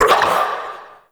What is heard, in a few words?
Male Vocal Pain; Enemy; Vocals